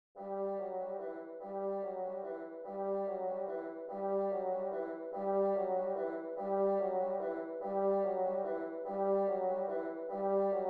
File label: carols of the bells; leontovich mykola